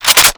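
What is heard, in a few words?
weapon foley g3 reload chamber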